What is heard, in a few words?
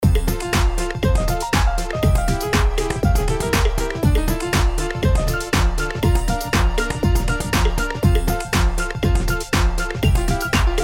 Ringtone